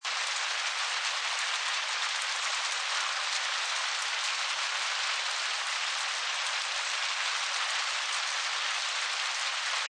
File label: Nature Weather Rain Loop, Natural rainfall sound, medium intensity rain falling onto porch roof